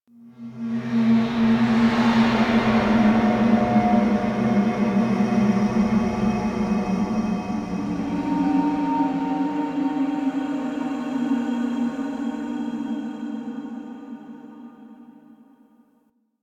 Asteroid Field Fortress Reveal Stinger

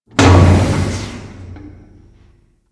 Settlement Machine One Off; Ambience; Warframe; Grineer Settlement

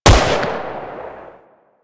Rifle Shot; Crp Ruban Rifle

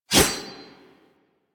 Sword Swipe, Melee Whooshes; Warframe; Weapons

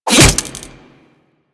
Throwing Taser Sticky Bomb Adhere; Warframe; Tenno

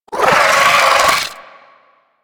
Amalgam Moa Satyr Vocal Attack; Warframe

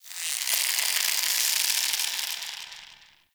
Under City Rope Creak

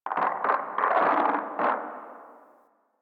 Venus Landscape Glacier Ice Distant Small; Ambience; Warframe; Venus Open World

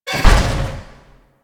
Piston Fall Short; Warframe; Ambience; Eidolon Plains

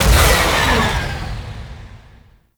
Corpus Ship Fighter Thruster Lift Off; Ambience; Props; Warframe; Corpus Ship Remaster

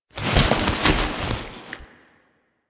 Foley Stand; Warframe; Kahl

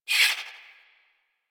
Swing, Melee

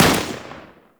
Deth Cube Fire 3D, weapons; Warframe; Sentinel; Deth Machine Rifle

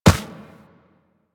Run Dirt, Footsteps; Warframe; Operator

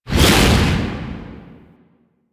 Heavy Hammer Whoosh; Warframe; Duviri; Dax; weapons

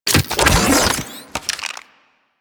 Briefcase Launcher Reload C, Weapons; Warframe; Corpus

